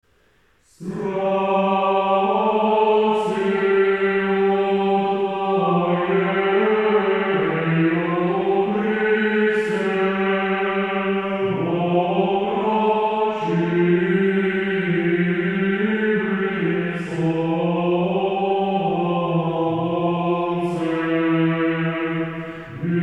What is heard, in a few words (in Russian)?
стиховна 3 глас; Страстию